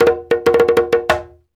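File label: break drum, Reggae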